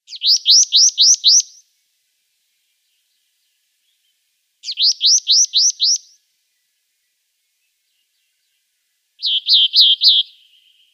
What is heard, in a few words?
Sombre Tit song